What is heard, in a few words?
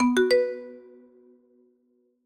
message new sms; stereo